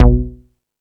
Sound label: Rap Session, Drum Machines